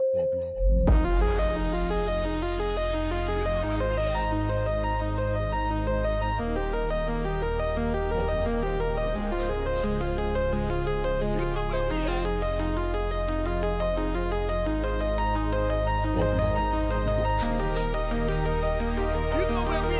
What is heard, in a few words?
POP EDM